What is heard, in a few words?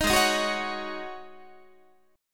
G sharp 7th Suspended 4th G sharp, Guitar; G#7sus4; strummed